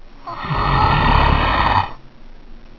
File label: pain; sven; aomclassic; monsters; spitter